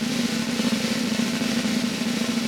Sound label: Vintage Snare Drum